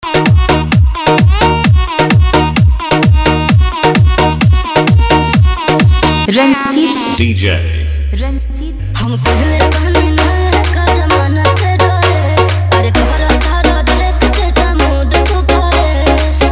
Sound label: Bhojpuri Remix